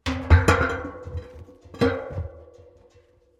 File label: Pipes Metal Impact Tyre; Material components to create more complex sounds; Materials